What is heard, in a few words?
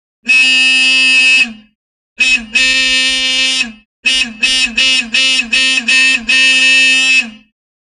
Horn Car Horn Toots, foreign